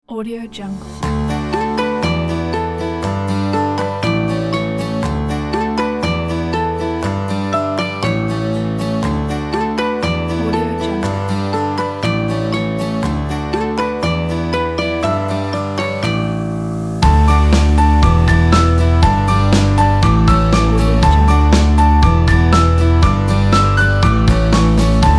happy folk

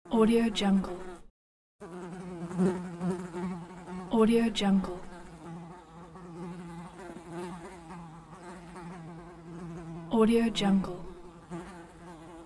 fly

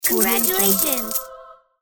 win money

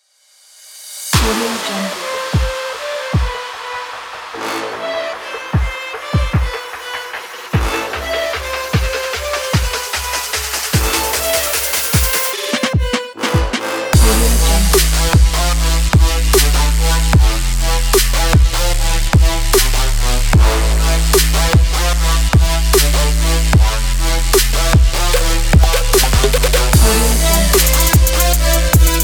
trap

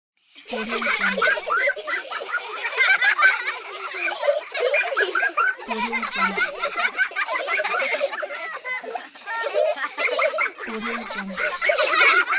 kids laughing; incredible-sound

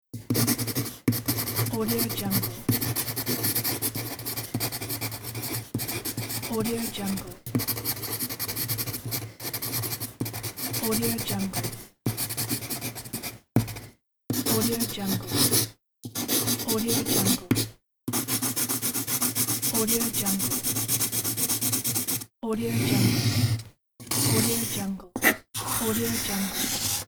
pencil

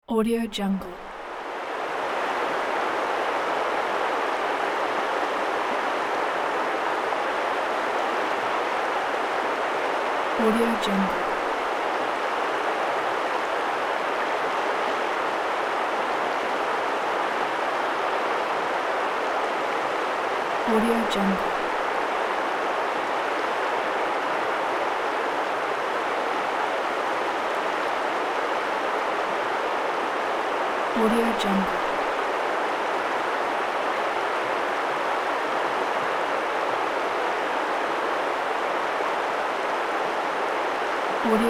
distant river

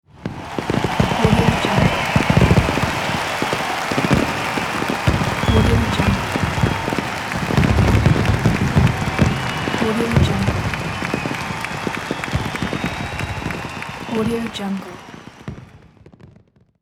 crowd cheer fireworks

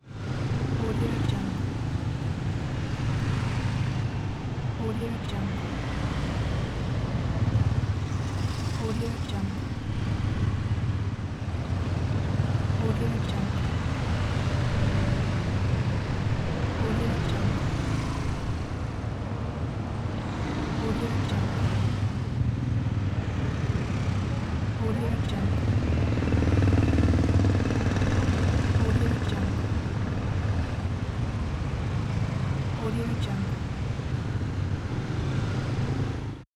hard city car traffic ambience; holy-records

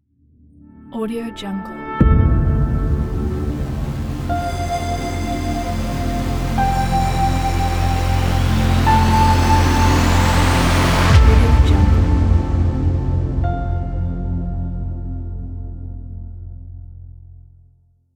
cinematic cosmic logo

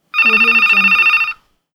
electronic telephone ring; ldj-audio

